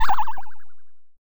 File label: death